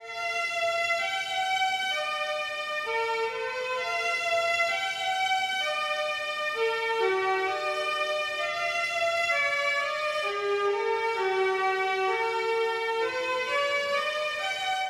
strings; Pony Fan Music Remix Stems

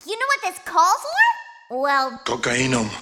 VOX Drop, 160 BPM

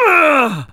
Grunt; Lewd SFX

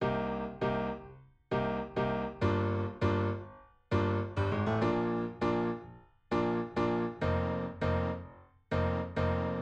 piano

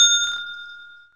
Ding; Lewd SFX

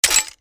attack pre launch; Li Li Shadowpaw Sound Effects; Li Li Heroes of the Storm Responses; warcraft

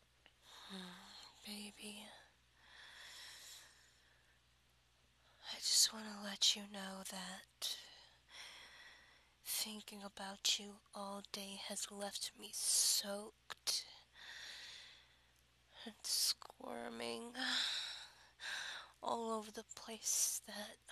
Begging, Whimpering, Sucking noises; PLEASE Sit on My Face!; Facesitting, of course

